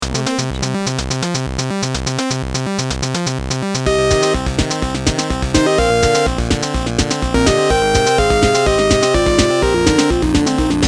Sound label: chiptune retro 8bit action loop